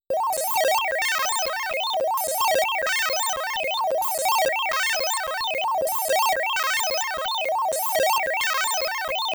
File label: Tractor Beam Capture; Galaga